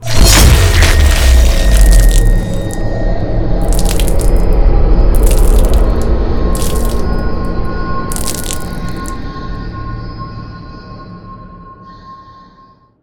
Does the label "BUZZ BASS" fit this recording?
No